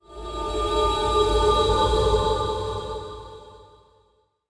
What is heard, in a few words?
Scull Ghost; Dark Dimensions: City of Fog